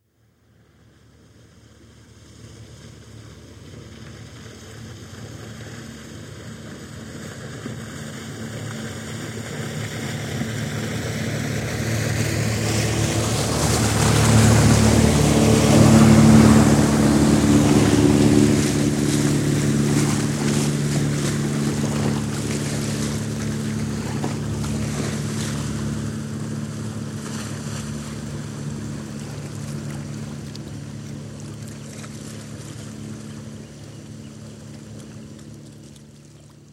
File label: Cabin Cruiser, pass right to left (Recorded on shore) (27ft, 50hp diesel engine); Boats; BBC